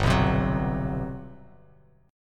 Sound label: G Major 11th, Bass Standard; strummed; JGuitar